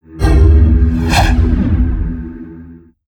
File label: Multi casting; Fly For Insanity